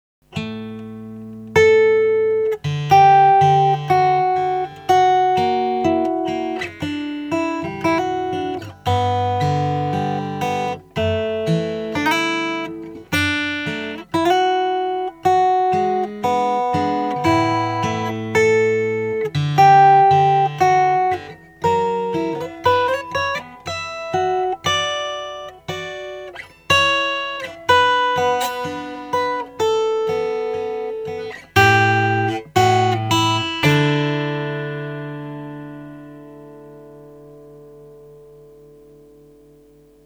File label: fender; fender esa